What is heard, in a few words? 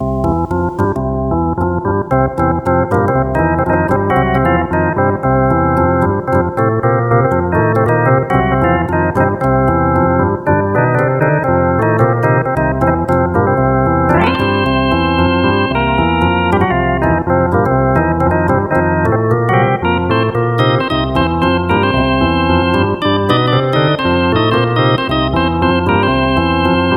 Space Organ Version